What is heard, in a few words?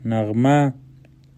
melody